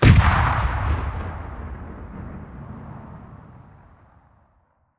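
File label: Reaper Nuke Destruct Explosion Distant; Titanfall 2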